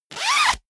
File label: zipper pullover jacket open fast; objekte; reissverschluss